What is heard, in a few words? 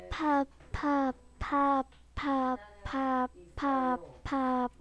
pop